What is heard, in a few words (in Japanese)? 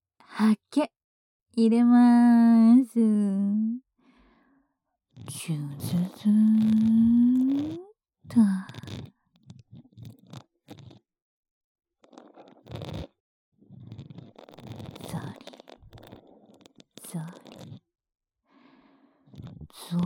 右 耳ブラシ, ASMR